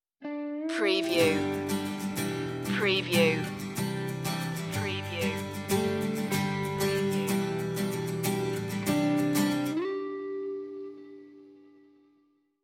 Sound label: crash stinger